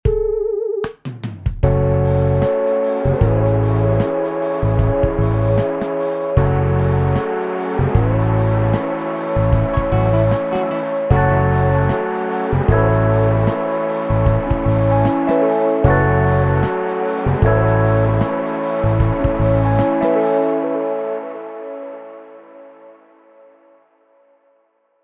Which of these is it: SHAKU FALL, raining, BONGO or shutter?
raining